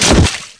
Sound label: body fall mud; Spearhead Demo; MacFormat 2003 June